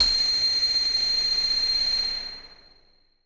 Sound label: ice beam high long